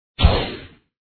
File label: black widow attack contact up